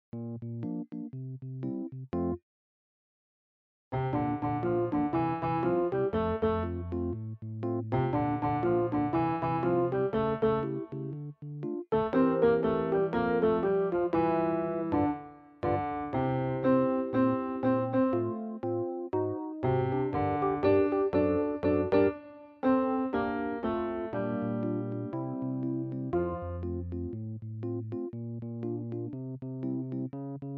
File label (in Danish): Bas; Edderkoppespind